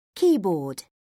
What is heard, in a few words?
keyboard; Oxford Word Skills Intermediate